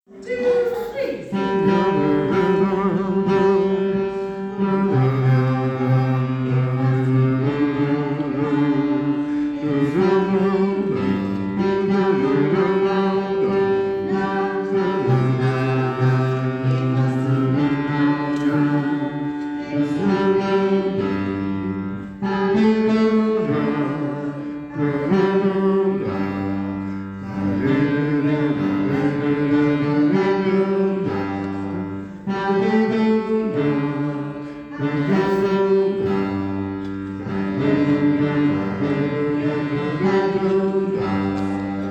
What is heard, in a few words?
Bass